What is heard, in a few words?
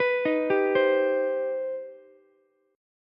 C Minor Major 7th, Ukulele; arpeggiated; JGuitar